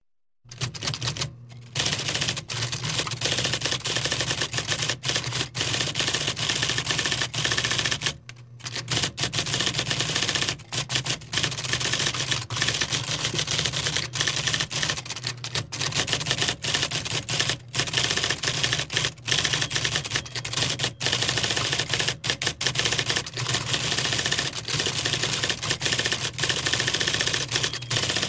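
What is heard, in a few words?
sound of typing on a typewriter fast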